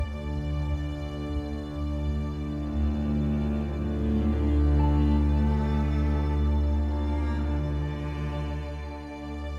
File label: prebattle night; Shadowhand; macos windows gamerip 2017